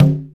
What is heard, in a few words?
Linn Drum, digital; 90 s Sample CDs; Sound & Vision - Gigapack I CD 1 (Roland)